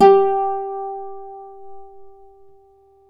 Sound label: Celtic Harp